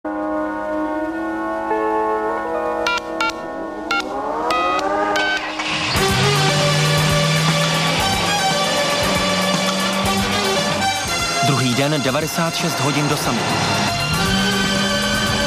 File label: start up; A PLUSH RIDE; KITT